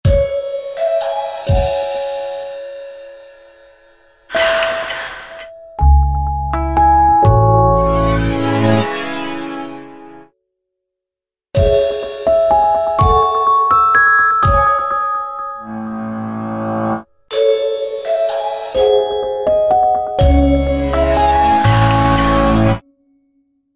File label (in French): Sonnerie Epure; Peugeot